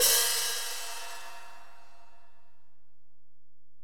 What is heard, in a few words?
HiHat, Alesis DM-PRO